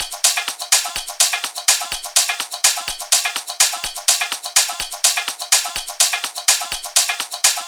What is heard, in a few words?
PERC